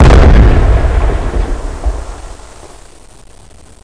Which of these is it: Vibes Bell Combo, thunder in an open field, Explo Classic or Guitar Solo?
Explo Classic